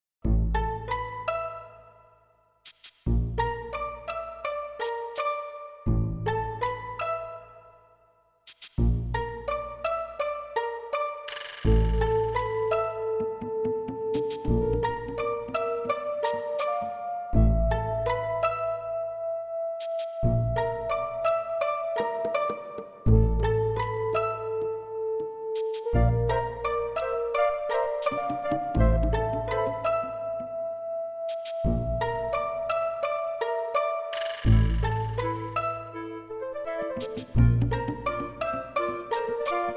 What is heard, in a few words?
Cave, mt-32; quest for glory so you want to be a hero mt-32 ms-dos gamerip 1989; Kobold Cave